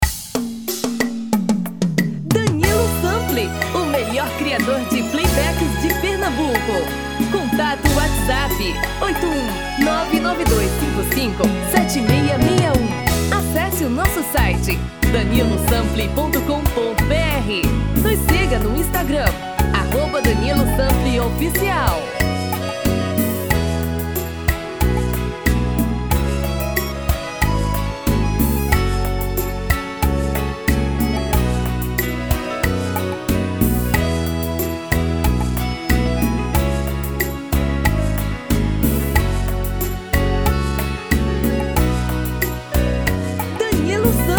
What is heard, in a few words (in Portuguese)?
BOLERO; seresta